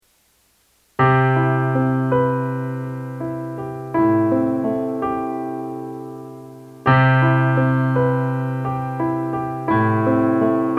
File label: solo piano